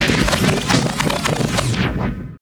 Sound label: Scratch Tape; Ambient Grooves